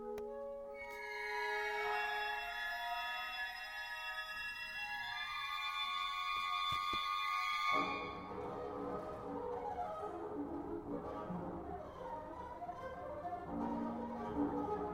double bass concerto; fujikura

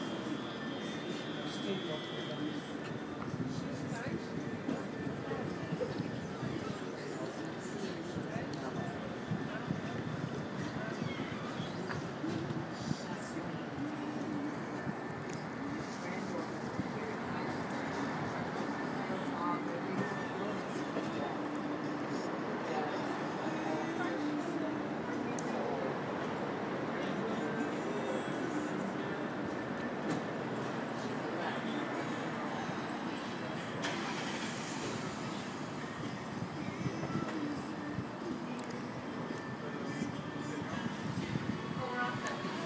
ambience

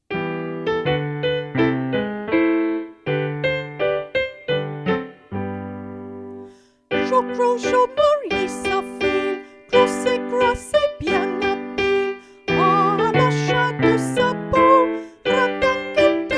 jean gros jean sung

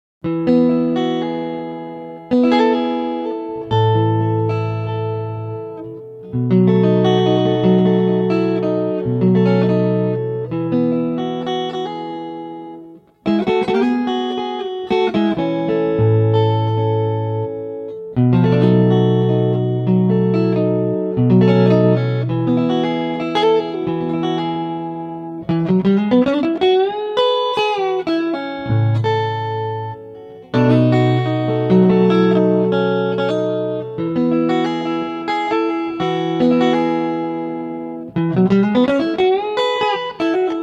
sunrise picking

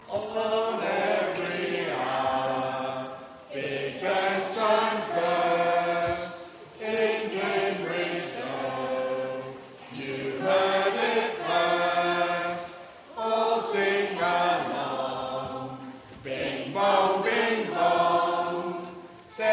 chimes; singing history concert 2016; great st mary's

